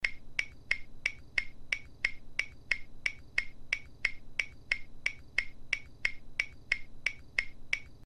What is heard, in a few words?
audio timer